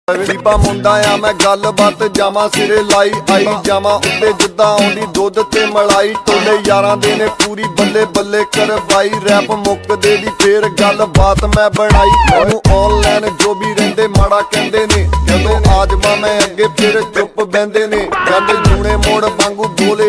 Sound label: Desi Punjabi Ringtone